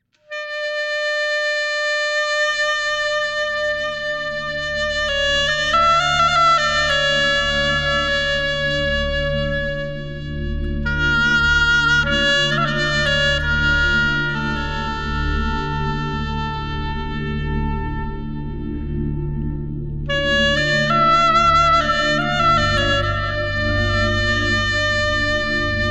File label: Instrumental Version; Bakka And Kesar